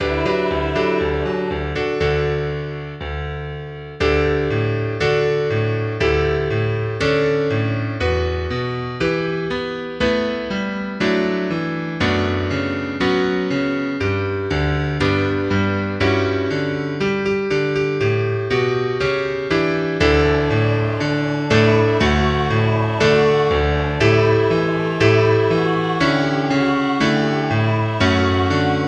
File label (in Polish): hymn akompaniament